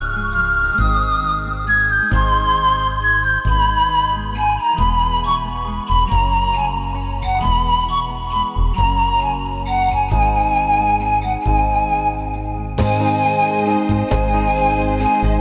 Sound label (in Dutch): Panfluit